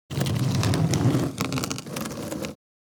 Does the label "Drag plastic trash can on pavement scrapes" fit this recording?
Yes